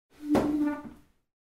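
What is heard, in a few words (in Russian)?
kuhonnyi stul, kitchen chair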